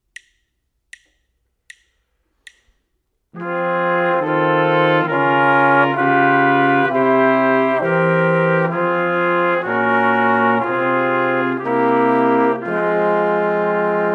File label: harmonic dictation; ear training